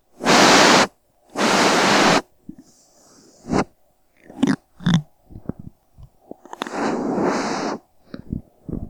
canned air

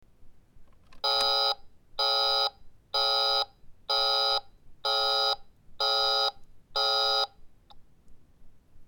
toy phone line busy

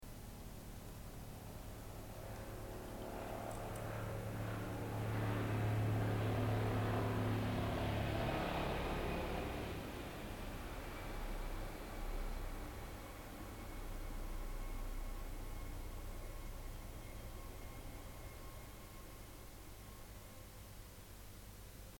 Helicopter Over Quiet Neighbourhood

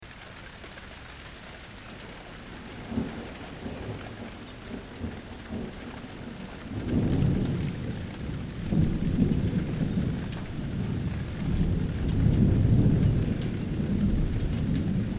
rain thunder and birds

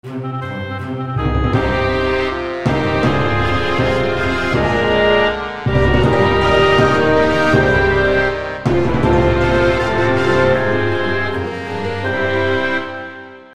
Jingle; Encore le theme de Julie

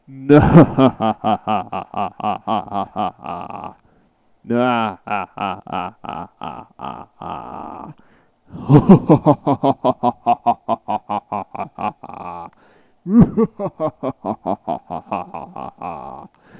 evil laughs

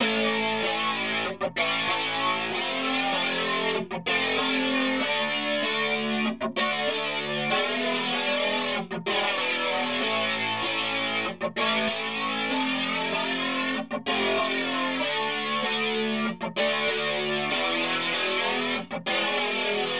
guitar

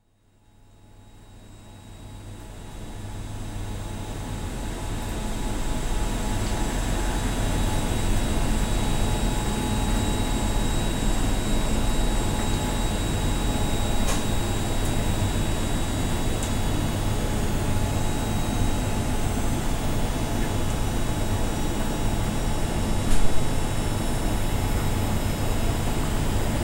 laundrette

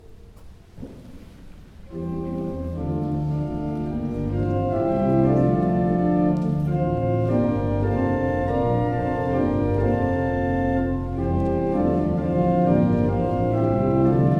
mass organ and singing

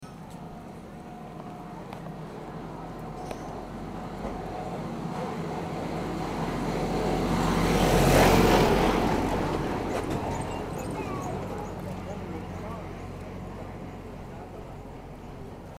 motorbike

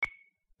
bip

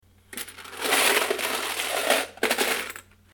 coins moved in a metallic box

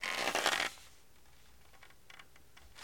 Bow draw

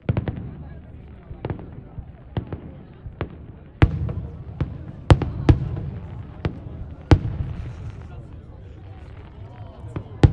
firework part

